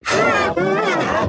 pain

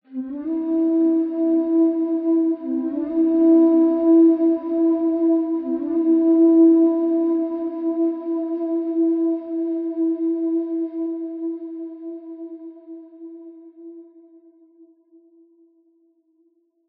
Flute Stinger

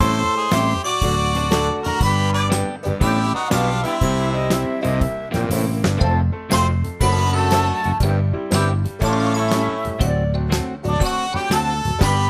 strolling music; Sesame Street Ready Set Grover

